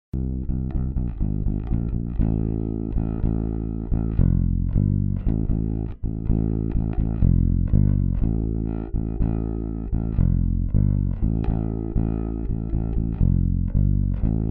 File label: Bass; Millenia